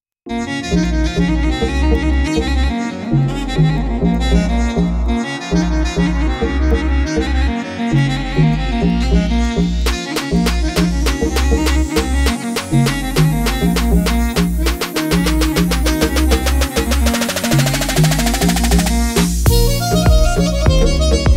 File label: beats armenian vibes